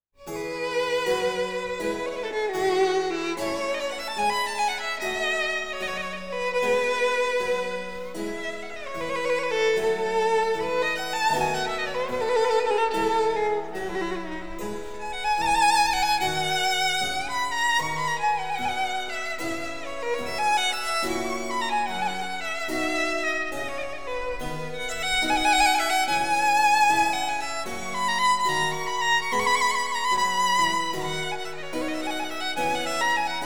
Violin Sonata in E major, op. 5 no. 11- 1. Prelude. Adagio; Corelli